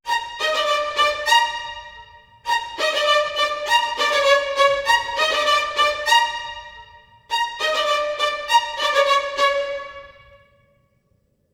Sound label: 100 strings hi, Orchestral arrangements, Gmin; PowerFX - Downtown Orchestra